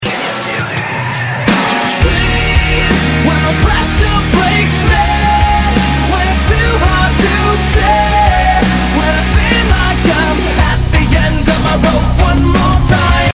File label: Scream